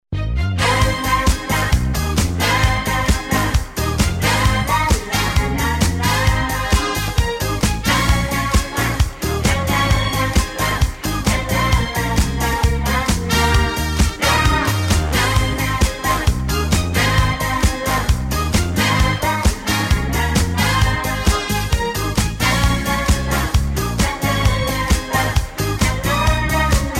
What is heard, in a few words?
JINGLE BELLS DISCO